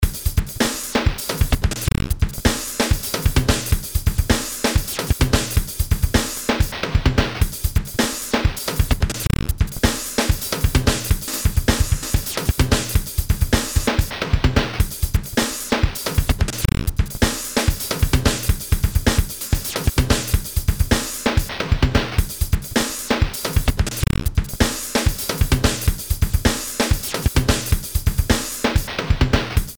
Bed - Stutterforth; Best Of N2O Library

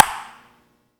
PING PONG, SPORTS; 1000fx

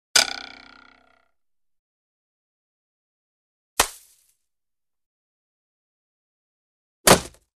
Arrow Hits Arrow Hit Target & Wobble, Archery; Hollywood Edge Foley Sound Library

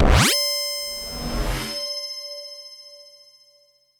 SPACESHIP

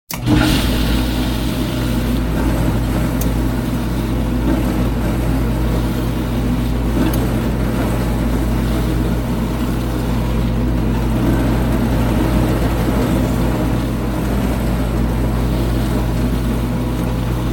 heavy machinery; SOUND EFFECTS1

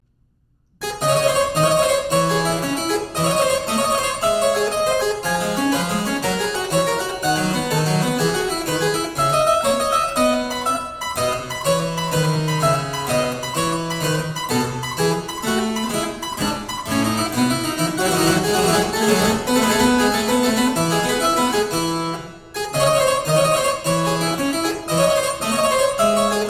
Giga, Harpsichord; Ouverture Burlesque; Telemann

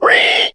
PIG; 1000fx; ANIMALS

